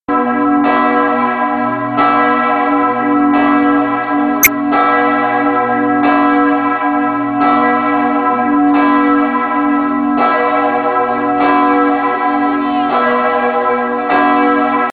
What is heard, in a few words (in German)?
Glocke neu; beutelsbach